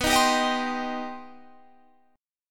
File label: C Minor B; Cm/B strummed